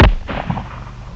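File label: SHOTGUN; CMI Sound Library Rev 1.3; MACHINES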